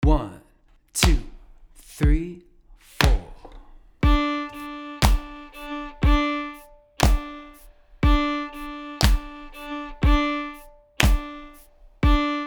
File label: tucka open d, fiddle; basic accent patterns